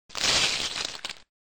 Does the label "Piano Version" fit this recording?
No